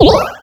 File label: bunny; garrysmod